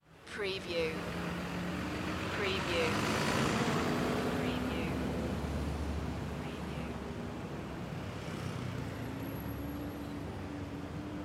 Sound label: Italy Traffic Light; sound-effects-bible